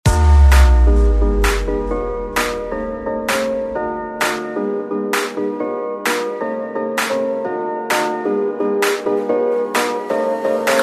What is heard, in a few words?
Club Future House